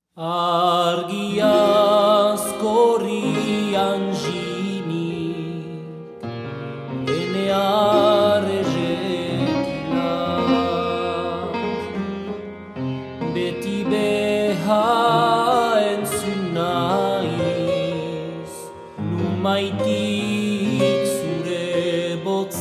Arr. for fortepianos

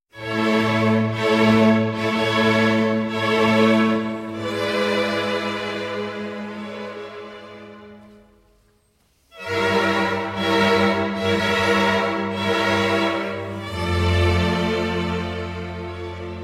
Cello Concerto in D Major Adagio

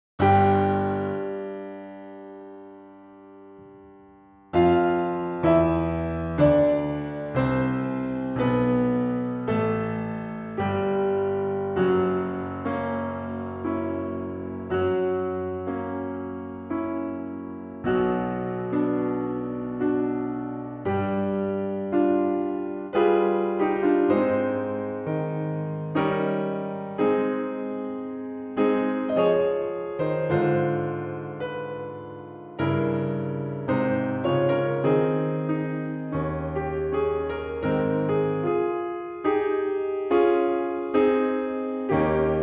Overture, Solo Piano; Leonore Overture No. 3, Op. 72a; Ritter

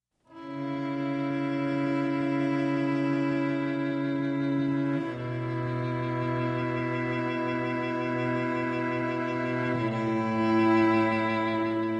Adagio sostenuto from Piano Sonata; Moonlight Sonata; No. 14, Op. 27 No. 2

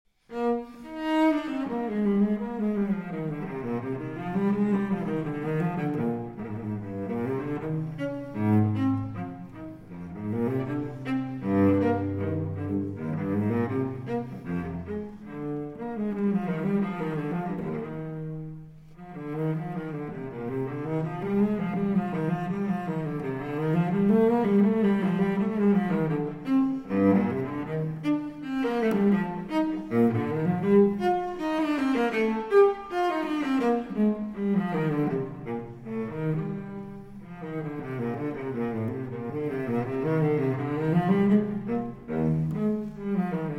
Cello Suite No. 4 in E-Flat Major, Allemande; BWV 1010

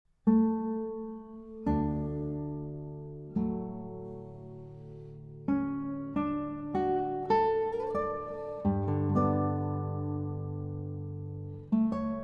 Kinderszenen - Träumerei (Arr. For Guitar); Schumann; Op. 15; Arr. Lewin For Guitar

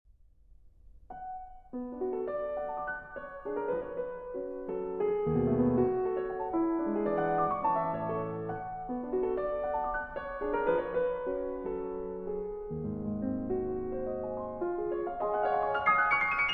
Valse-caprice in A Major

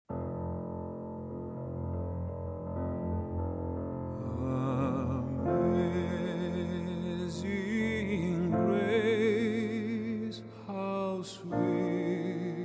Acoustic

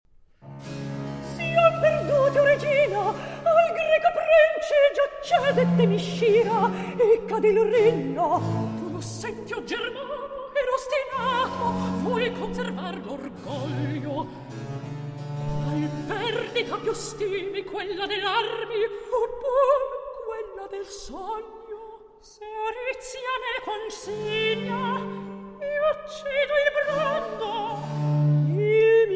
Recitativo; Orizia, Ippolita, Antiope

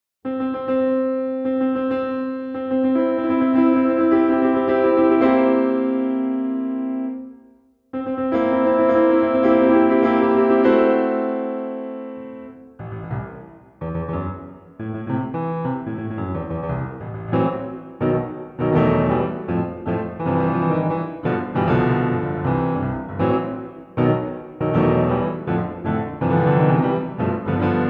Wedding March; A Midsummer Night's Dream, Op. 61; and Elfin Chorus; Arr. By Franz Liszt

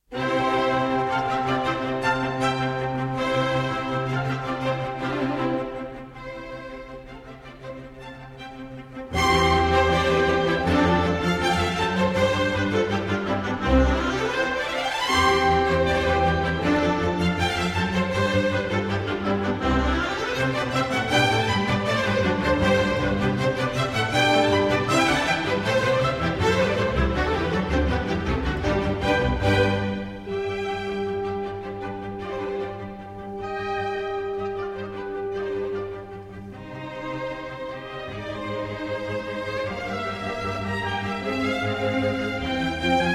Cello Concerto in C Major, Allegro Molto; Haydn; No. 1; Hob. VIIb1

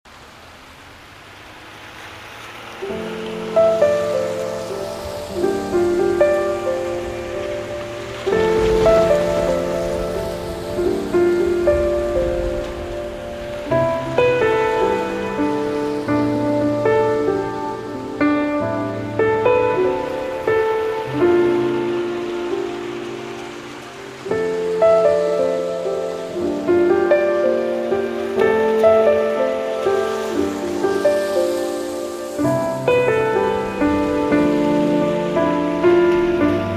Piano and Rain

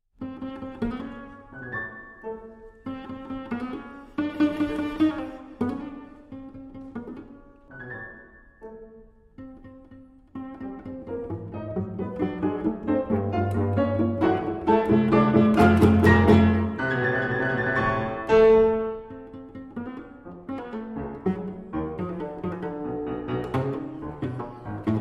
Cello Sonata in C Major, Scherzo-pizzicato; Op. 65; Allegretto; Ed. Rostropovich

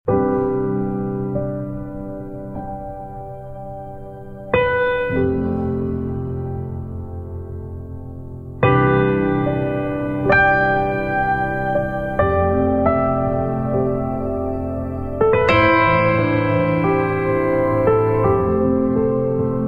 Deep Sleep Piano

